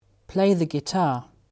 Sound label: guitar